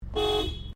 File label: CAR SUBARU LIBERTY EXTERIOR IDLE HORN LONG; Family members Subaru; Subaru Liberty 2001